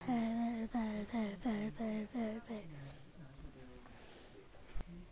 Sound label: bell